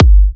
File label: G, kicks; markus hakala kicks